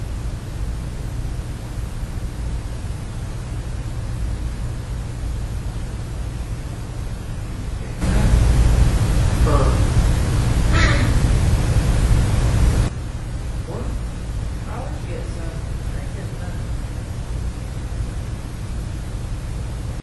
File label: Theatre Stage Unexplained noise Duck maybe